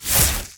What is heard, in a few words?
faery dragon attack solo attack